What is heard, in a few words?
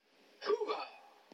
Hoo-Hah